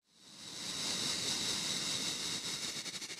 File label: lizard hiss; rain world